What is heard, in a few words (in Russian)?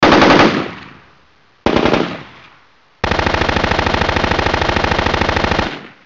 ak 47 zvuk vystrelov